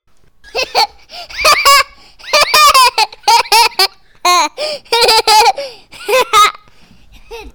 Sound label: Kid Tickle